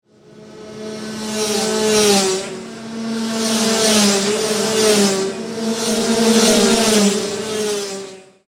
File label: ROTAX DD2 Sound